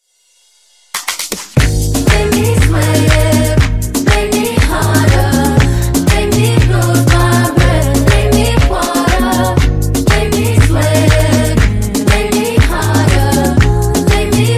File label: Funk, 120Bpm